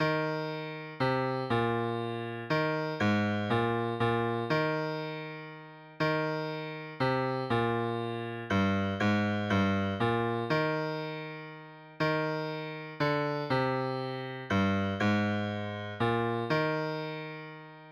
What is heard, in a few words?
Bass